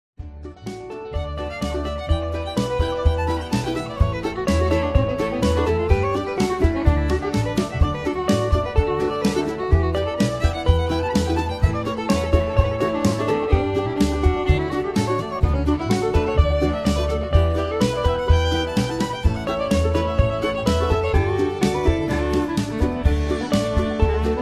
Key of D

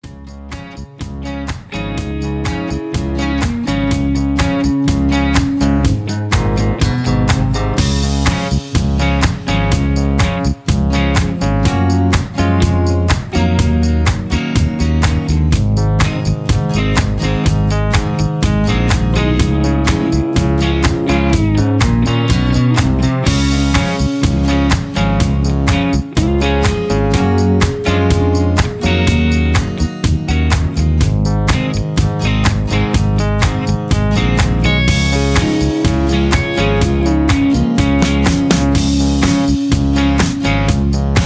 Instrumental without Leads

